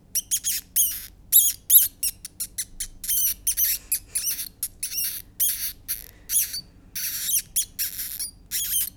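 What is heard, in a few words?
Eolagurus luteus juvenile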